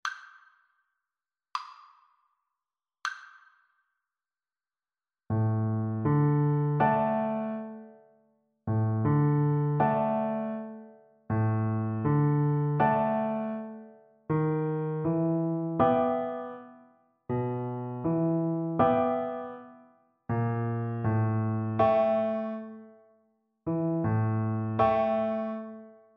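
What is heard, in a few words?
viola karaoke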